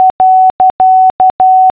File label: period, morse; The Numbers Racket CD-ROM